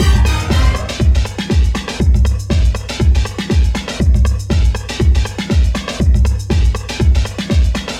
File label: House Groove; Sonic Foundry (Sony Creative Software); Dr. Fink's Funk Factory; Complete Loops